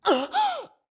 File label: Archer damage; Metal elf; Mob